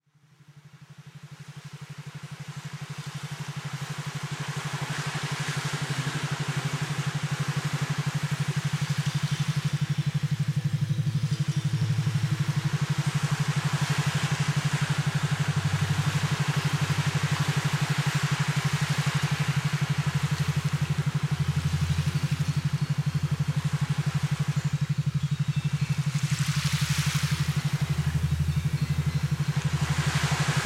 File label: Brainwave Shots Relaxation